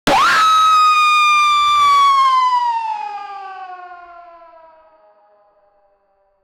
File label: raw audio sample chain gu, siren